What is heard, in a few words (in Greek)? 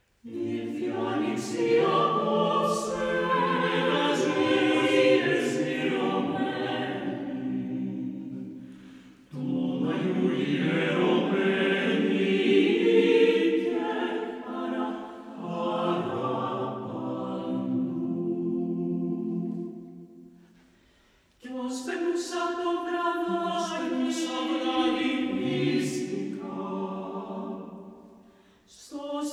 Η Χορωδία της ΕΡΤ